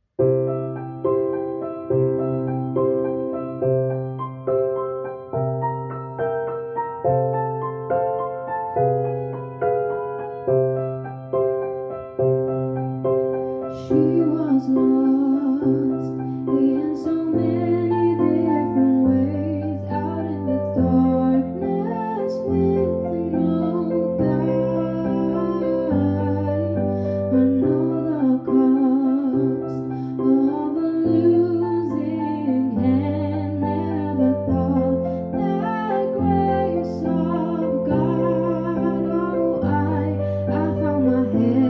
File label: avemaria soprano, wedding songs